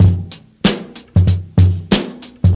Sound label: 125 bpm drum groove f sharp key, Free breakbeat sample - kick tuned to the F# note. Loudest frequency: 740Hz